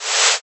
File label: whoosh up